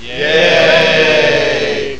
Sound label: CLAP; WOLF; NITMARE